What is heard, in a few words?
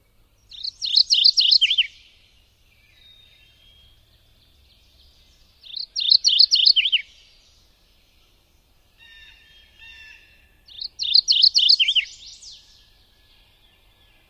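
Mourning Warbler, songs number 1; NY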